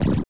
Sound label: jump; alienarena; grey2